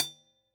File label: hat bell